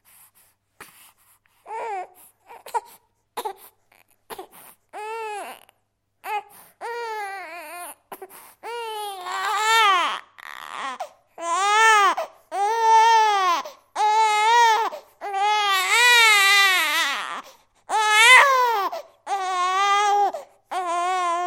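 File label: newborn baby crying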